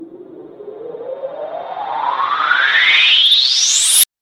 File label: Bright Riser